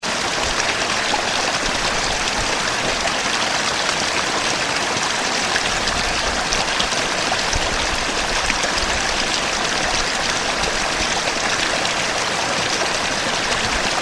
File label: River